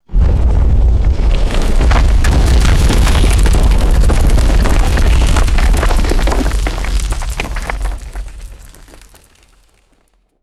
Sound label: deep earthquake rumble with crumbling rock debris; natural-disaster